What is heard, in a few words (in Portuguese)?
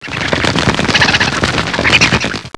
morcego